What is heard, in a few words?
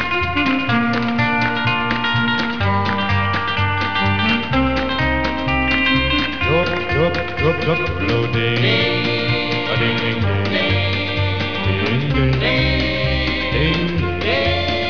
whispering bells